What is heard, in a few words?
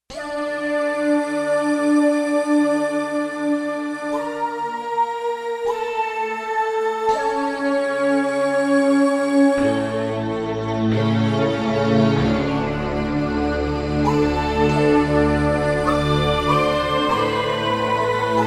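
Big Japanese Flute; Digital Synthesizer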